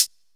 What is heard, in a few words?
soft hihat sound e key